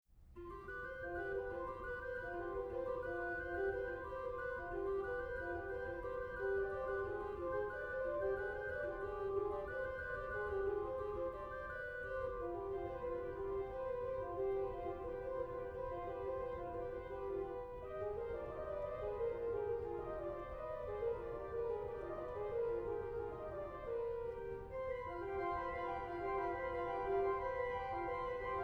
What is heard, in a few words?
Organ